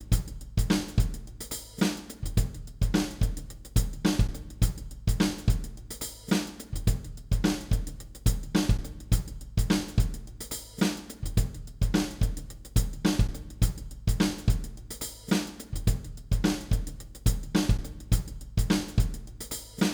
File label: Loop 16 note HiHat